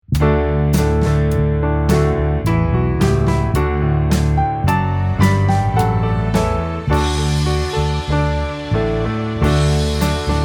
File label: The Twelve Days Of Christmas backing